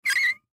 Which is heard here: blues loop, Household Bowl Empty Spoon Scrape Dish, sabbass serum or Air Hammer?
Household Bowl Empty Spoon Scrape Dish